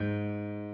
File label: key